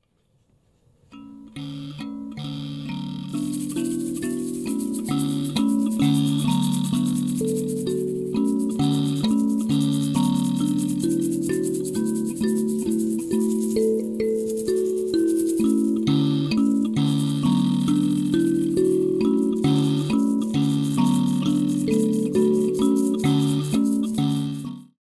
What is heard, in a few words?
mbira